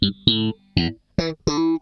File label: FAZE PLUK, Funk Guitar; 90 s Sample CDs; Zero G